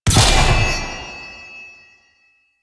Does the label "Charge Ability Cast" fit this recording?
Yes